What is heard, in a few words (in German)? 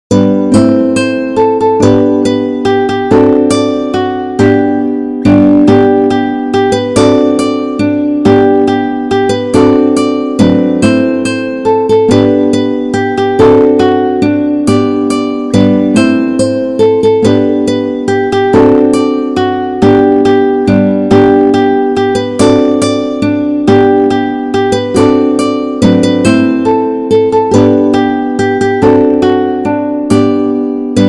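Am Fenster heute morgen, Gitarre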